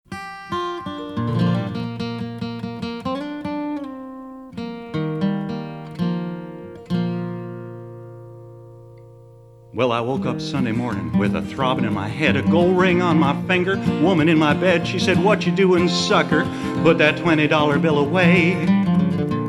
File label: Sunday Blues